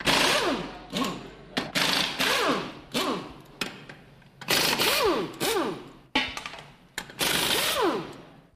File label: Impact Wrench Drill, air wrench & ratchets